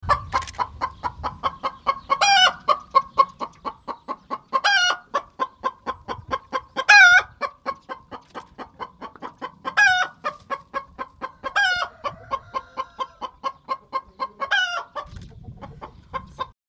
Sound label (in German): Huhn beim Eierlegen